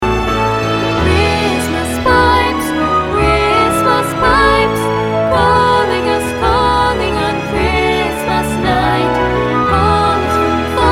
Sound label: Christmas Pipes